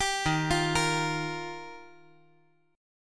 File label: D sharp Major Add 9th; chordshape